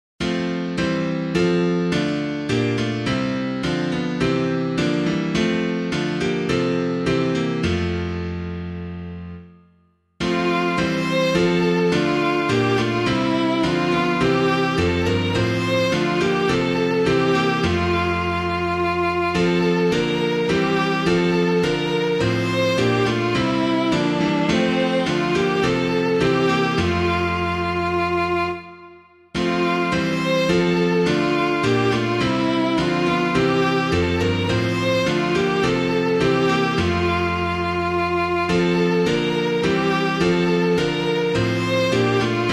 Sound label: The King Shall Come When Morning Dawns, SAINT STEPHEN, piano, hymn